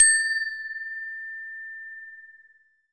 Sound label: percussion bells